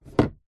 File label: industrial power steering fluid in plastic bottle set down wood shelf